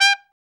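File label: BRA TRUMP, trumpet, brass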